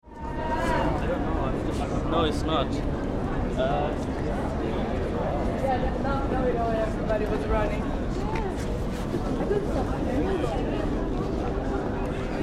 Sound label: Crowd Queuing for Show, West End show crowd - Chatter and some traffic